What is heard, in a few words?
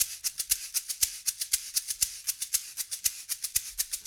Shaker, 70s Analog Funk; Sonic Foundry (Sony Creative Software); What it Is! 70s Analog Funk